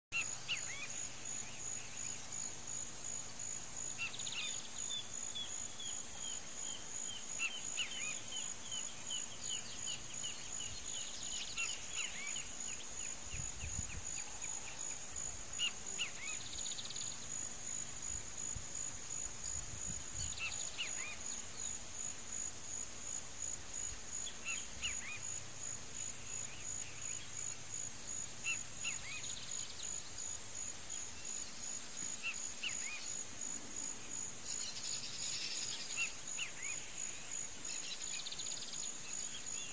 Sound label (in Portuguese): canto do soco pintado